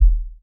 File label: Infra Kick